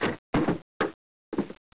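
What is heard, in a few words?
warehouse; Caesar 2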